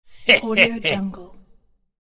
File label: male malicious laugh